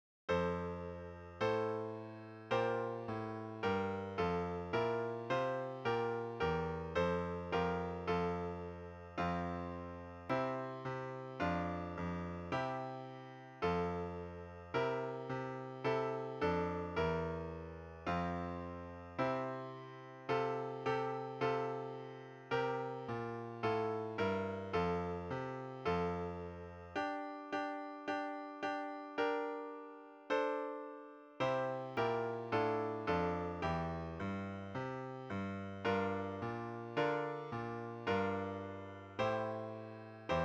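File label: Solo 5 F Brass; Solo Five; Stage 4; Development Band Tuition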